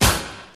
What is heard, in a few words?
snare one shot c sharp key, Loudest frequency: 3578Hz